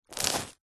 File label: food bread baguette tear or crush; zapsplat